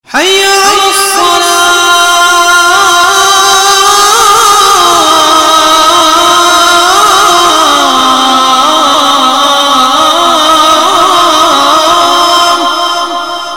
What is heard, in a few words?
Hayya Alassallah Azan; Islamic